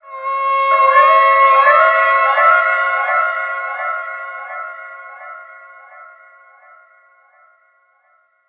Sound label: VIOLINS FX; USB Soundscan vol.60; Asian Traditions; AKAI; VIOLON FX